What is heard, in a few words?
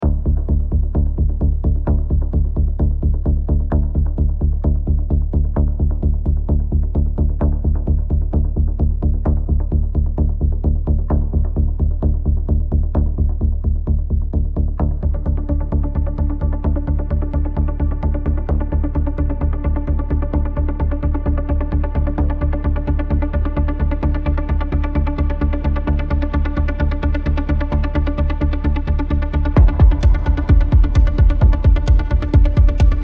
music